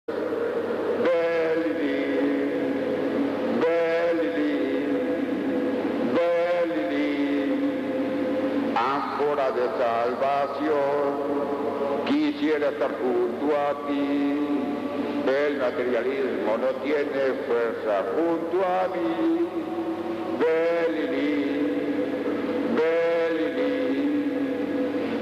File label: Mantra Belilin; V.M. Rabolu